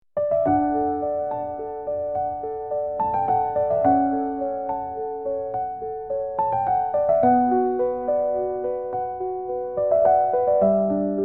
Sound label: relaxing music heart and dew